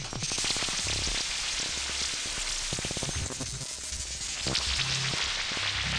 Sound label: eurorack